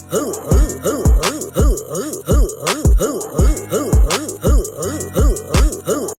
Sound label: eugh eugh eugh eugh